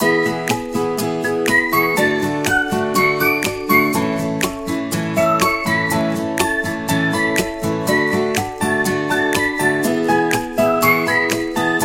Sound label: Happy Children